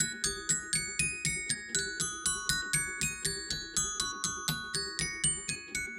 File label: Xylophone